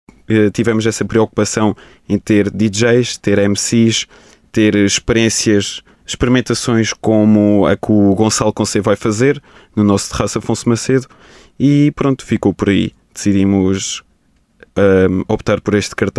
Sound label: Hip Hop